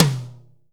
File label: TOM, Drum Dry; East Collexion; Drum 1